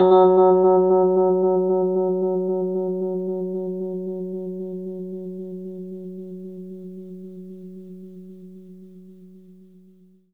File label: Rhodes fast F#; 3D Sound; 3D Keys VOL-1